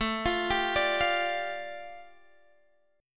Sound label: A 7th Suspended 4th Sharp 5th, A, down; A7sus4#5 arpeggiated